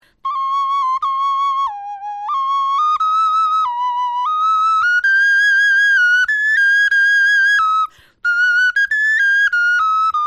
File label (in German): melodie, knochenfloeten